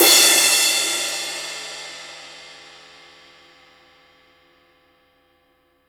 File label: CYM, Real Drum Kit